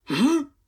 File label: Miner Throw; Col Miner Throw 01